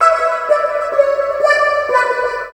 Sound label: GTR -L; East West; Phat & Phunky